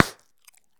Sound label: Bubblegum Pop; SFX Kit; Foley - Misc